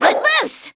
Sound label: shoot; p4u